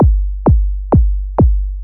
130 kick loop, 4 on the floor loop; Kicks

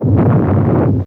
Expl, Weather; Fairlight CMI IIe

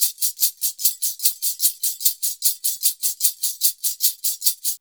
Modern Percussion Loops; Soundscan vol.56; AKAI

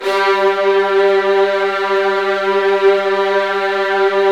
G1, Virtuoso Strings

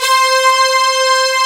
BRASS STAB C4; East West; Global Sounds

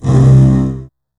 Virtuoso Strings; ILIO; Kirk Hunter Virtuoso Strings VOL-3; 90 s Sample CDs; LRGE STR HRD

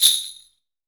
SUZU

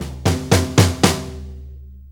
6-8 Fill, Drum Tools

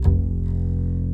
BASS, Drum & Bass, 140 BPM; Ueberschall; Drum & Bass Flash Trackz

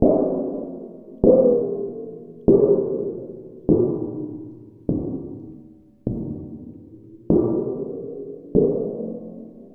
Steel Sheet Bent Mallet; Metal; Sonic Foundry (Sony Creative Software) - Ambient Atmospheres & Rhythms; Ambient-Metals

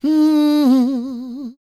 MMM, Vocal Blues Soul; Spectrasonics; Planet

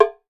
TIMBALE C, LATIN percussion; Voice Crystal - Steve Reid Definitive Percussion; LATIN SET

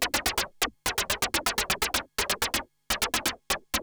Juno-60 Arp 125BPM; noise hiss crackle

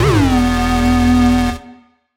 Siren Horn down, Siren-Horn Type Hits, Ramp Down; future rave

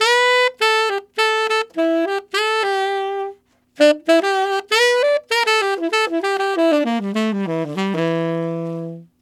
Tenor Rock in E min, Tenor Sax Loops; Sonic Foundry (Sony Creative Software); Jazz Solos and Sections

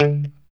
POP D 3, STRAT guitar; Spectrasonics; Hans Zimmer Guitars VOL-1; JC-STRAT POP

